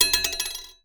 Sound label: knife blade bounce on hard surface